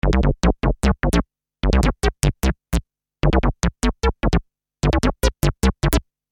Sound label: Syn Bass 150 Gm, Techno Synth Loops; SynBs; 150bpm; Sonic Foundry (Sony Creative Software); Signals I